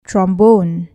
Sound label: trombone